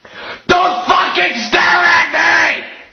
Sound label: YELLING; amnesia: the dark descent; madness archive; madness-ch03-demo; enemy; hater